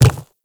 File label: Quadruped Medium Walk Default, Footsteps